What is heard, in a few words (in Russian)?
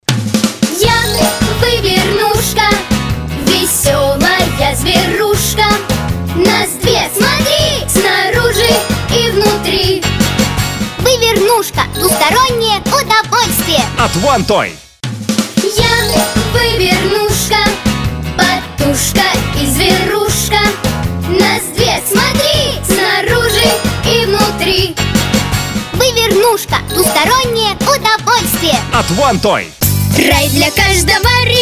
vokal